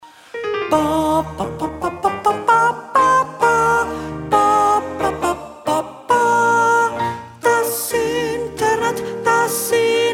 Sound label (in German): Sopran